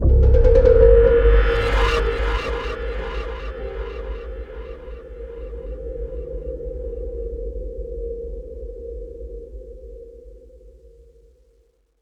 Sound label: Game Over